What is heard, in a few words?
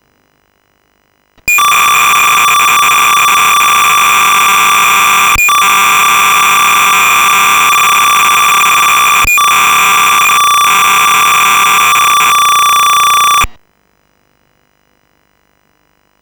tape